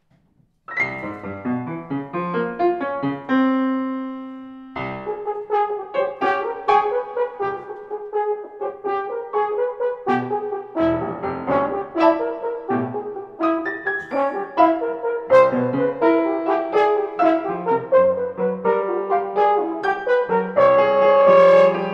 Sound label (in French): scherzo pour cor et piano extrait